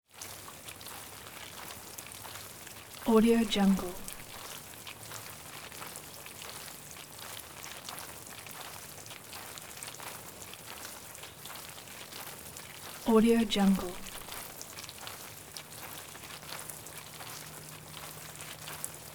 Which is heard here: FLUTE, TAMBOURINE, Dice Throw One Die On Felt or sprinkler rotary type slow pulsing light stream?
sprinkler rotary type slow pulsing light stream